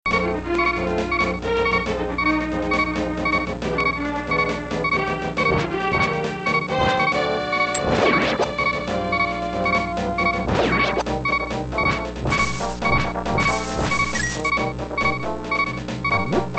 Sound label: hp alarm; zelda3